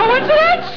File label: coin